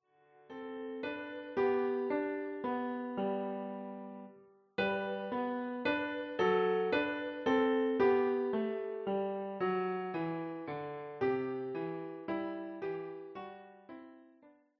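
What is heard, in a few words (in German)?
Leise rieselt der Schnee, Klavier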